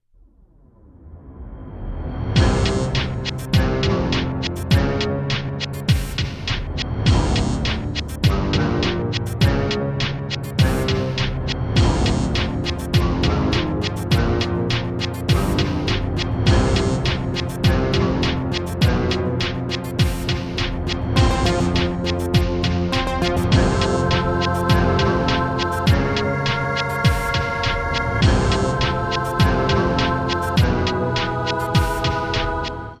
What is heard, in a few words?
hope jingle